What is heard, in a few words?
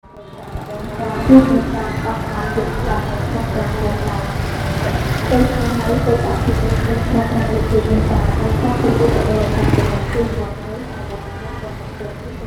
ambient cafe sparse, phnom penh; 2019; Cambodia phnom penh